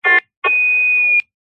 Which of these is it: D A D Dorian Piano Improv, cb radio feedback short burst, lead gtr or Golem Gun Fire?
cb radio feedback short burst